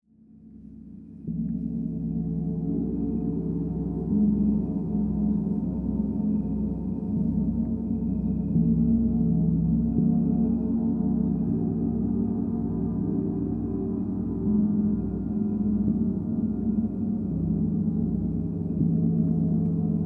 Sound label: Gong Bath Not Processed